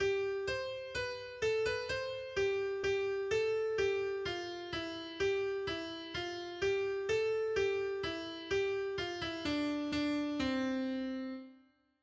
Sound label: melody